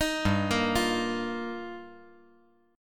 G Minor 7th Sharp 5th

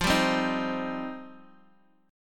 F 7th Suspended 2nd F down Guitar Open D

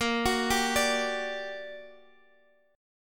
G Minor Major 7th, Bb, guitar chord

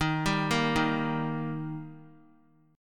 Eb Major, down; arpeggiated; 3C,3F,3A,3D

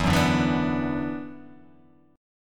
E 7th Suspended 2nd E down, guitar

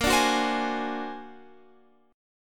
A sharp Augmented 9th A sharp Guitar Open F; A#+9 strummed; JGuitar

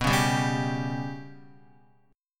B Suspended 2nd Sharp 5th down Guitar; Bsus2#5 strummed

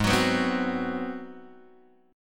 G sharp 7th Sharp 9th, Guitar, Open G

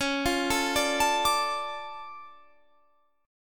D Minor Db, chord; Dm/Db arpeggiated

